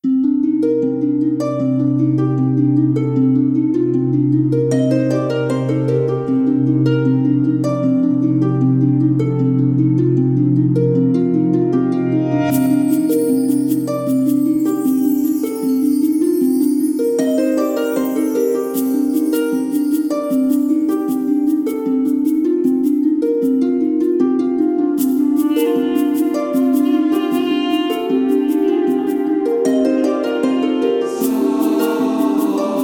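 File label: Background music dungeon general forest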